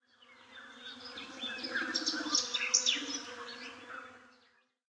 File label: bird; temple of nudges